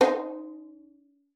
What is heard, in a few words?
FING D#4, VIOLIN; Xsample VOL-5